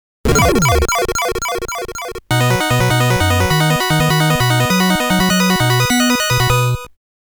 Game Over

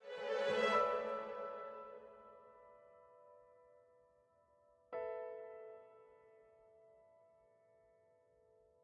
full horns; Sky Children of the Light gamerip

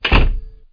Door Close

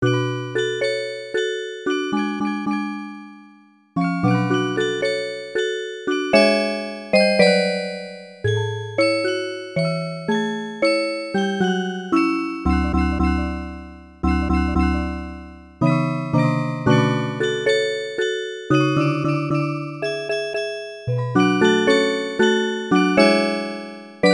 Music Box; Dayshift at Freddy's Trilogy original soundtrack PC 2019

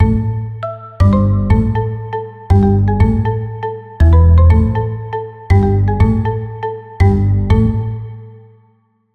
Saxophone

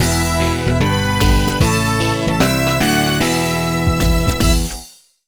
Pirate Coin Obtained; Lavasteam; Drawn to Life The Next Chapter; Nintendo DS

